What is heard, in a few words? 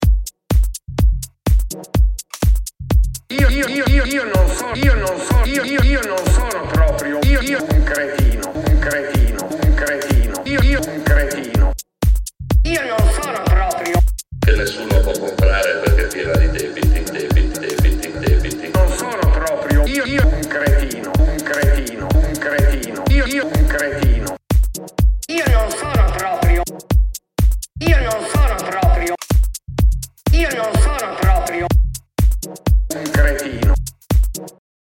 LOOP